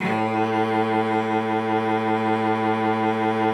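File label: cello vibrato, A2; Xsample VOL-7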